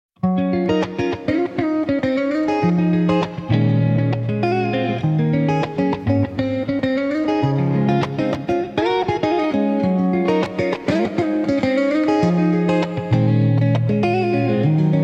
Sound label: library clock, free bgm